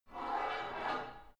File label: take off light weight barbell soft reverby, fitnessstudio; sport freizeit